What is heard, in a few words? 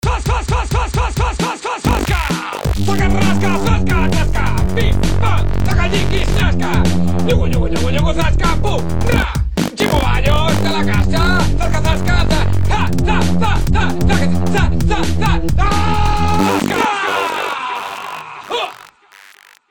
hardbass